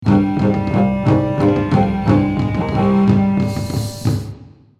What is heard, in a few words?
Empire game triumph success